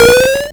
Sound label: Arcade Riffs